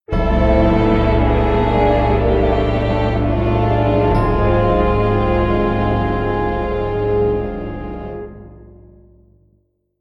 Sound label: GAMEOVER